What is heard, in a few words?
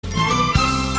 Note Short; Misato